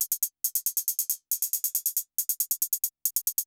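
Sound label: closed hi-hat, Techno 138 BPM; KOELN; Best Service ProSamples vol.54; AKAI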